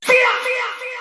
fahhfyahhhh

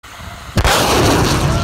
f18 sonic boom

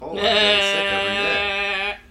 laugh

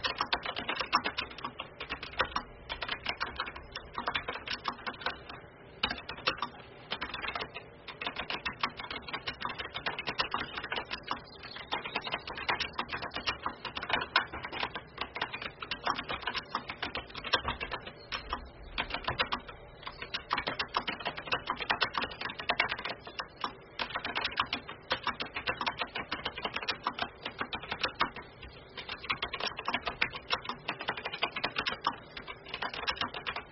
mechanical keyboard

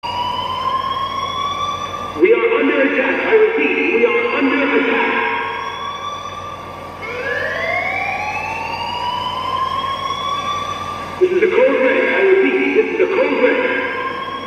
alarm; gta5 fort zancudo

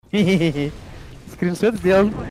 camera hehe, hehehe; skrinshot sdelan

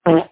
low quality fart

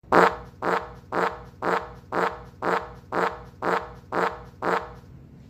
fart my pants

